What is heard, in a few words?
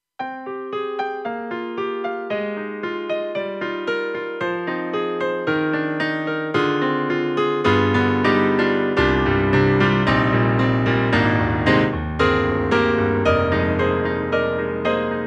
KORG M3 XPanded Grand PF On Stage 2; M3 Version 1 Wave